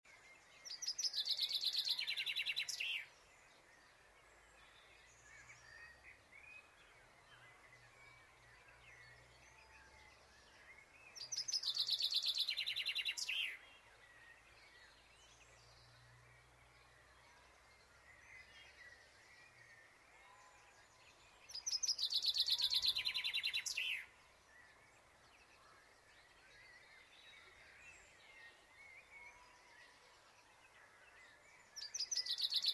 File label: chaffinch